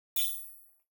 Button Very Thin Sci-Fi Button (pin); Hollywood Edge; sound designer's toolkit v2

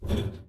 boots metal; Apotheorasis

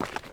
dirt; firefight

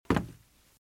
Footsteps - Wood - Creaky - Walk - Left; RadwinIsland

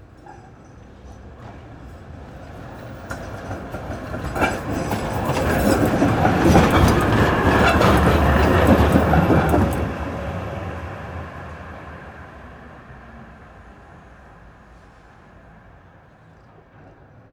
tram rolling; best service Blue Box

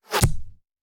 Bullet Impact; Sound Ideas; Guns

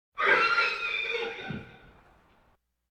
Animal effects snorts grunts farm ambience

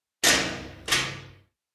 prison; Inquisitor 3

